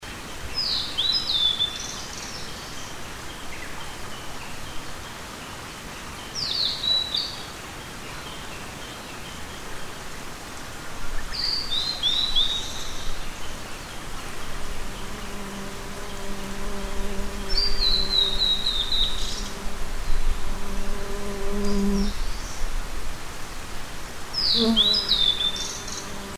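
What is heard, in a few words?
ooruri, blue-and-white flycatcher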